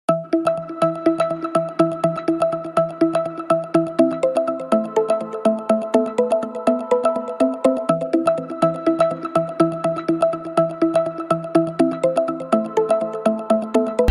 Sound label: Classical Ringtone; Samsung